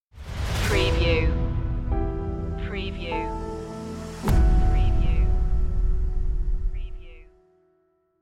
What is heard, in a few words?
building drama stinger